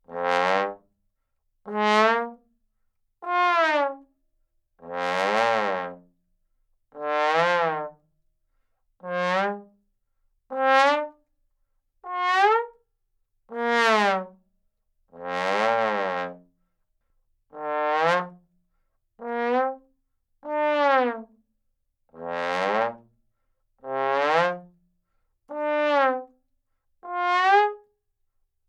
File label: Trombone Glissandi